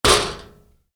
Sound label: Wood hit aluminium heavy, Wood, Impact: Aluminum, Heavy; Materials; Wood Sound Effects; Wood (Sound Effects:Materials); Stuart Duffield